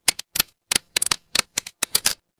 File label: mechanical gear